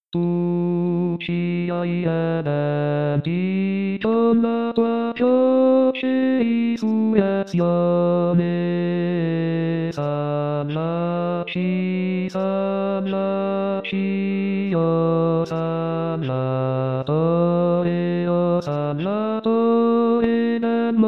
Tu Ci Hai Redenti Bassi; pasqua

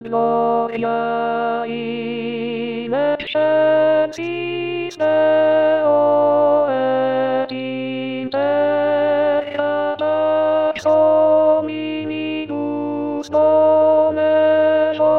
gloria contralti; anno liturgico

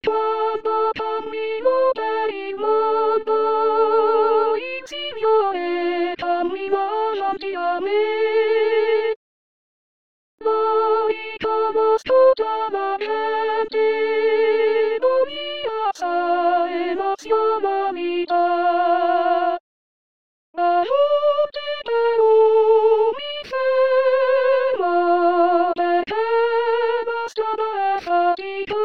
Voce

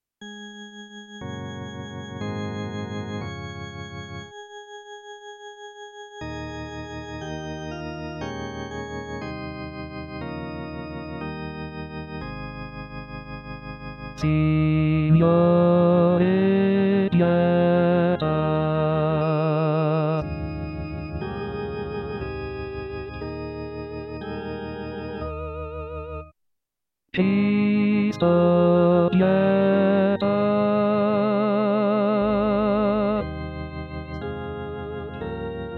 Giubilare Signore Pieta Bassi; Bartolucci

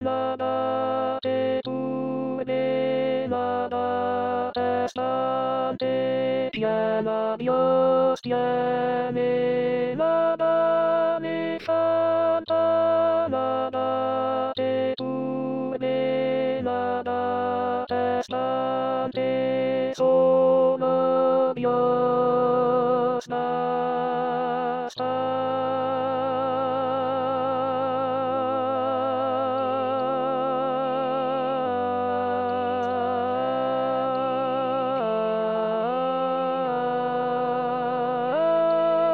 Contralti

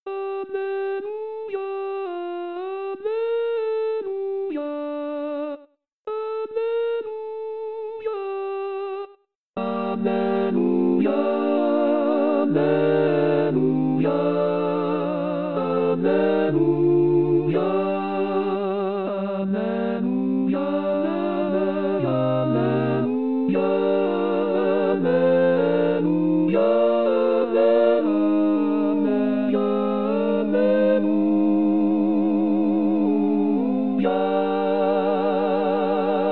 Alleluia Coro; anno liturgico

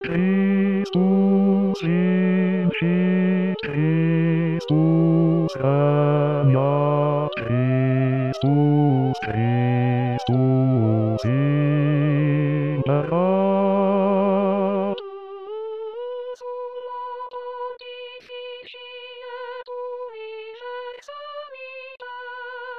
Christus Vincit Bassi

